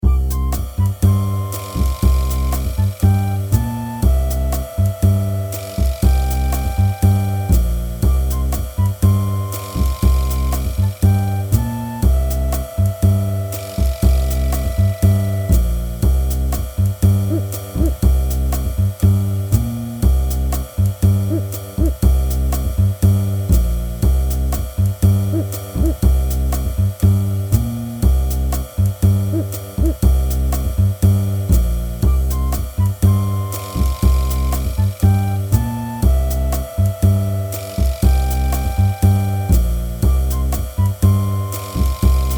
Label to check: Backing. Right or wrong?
Right